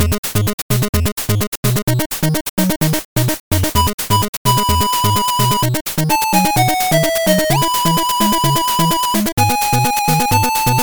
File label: beach stage bpm128, 8bit music for action game.